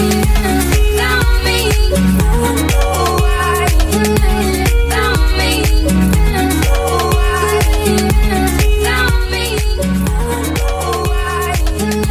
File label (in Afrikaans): vroulike engelse luitoon